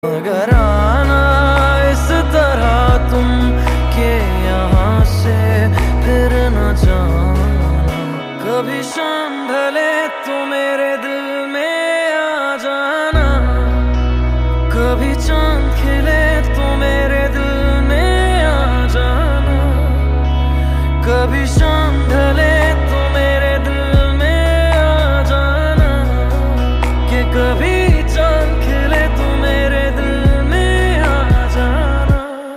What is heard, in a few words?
Lofi Mix Ringtone